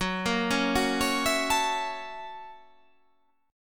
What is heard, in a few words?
F sharp 7th Sharp 9th